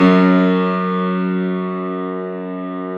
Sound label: Classical Piano mp +ped, F0; PS-53 Total Piano; Brilliand Classical Piano